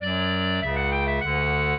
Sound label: clarinet; Mozart